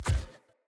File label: Corrupted Battle Dog Walk Step, Foley Footsteps; Animals